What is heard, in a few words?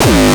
kick; shock. Ultimate Kicks vol. 2